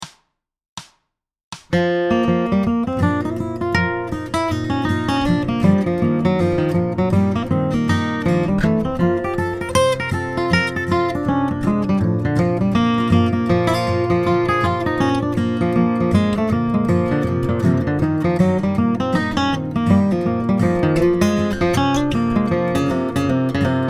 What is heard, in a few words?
Guitar, solo (no melody here!)